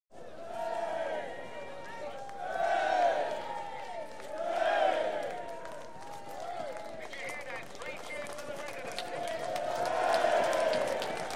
Street Crowds - Industrial Dispute, Cheers, Clapping And Booing; Human Crowds, Children & Footsteps